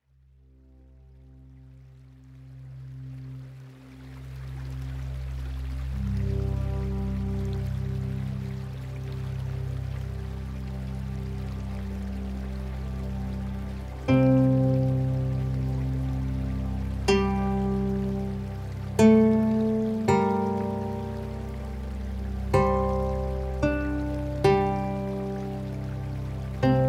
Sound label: Pink Noise